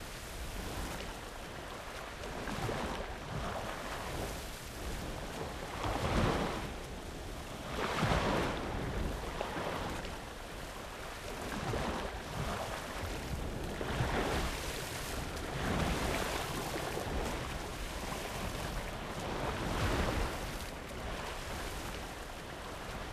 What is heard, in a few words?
ambient port default; World Of Warships